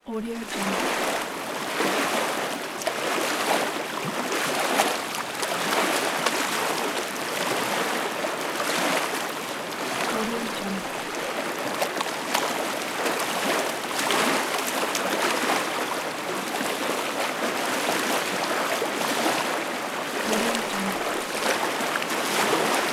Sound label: the waves; fx-library